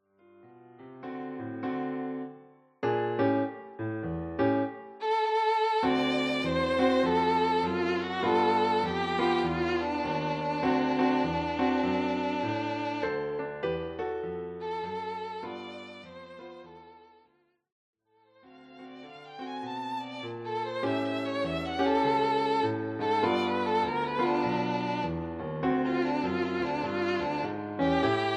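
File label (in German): Violine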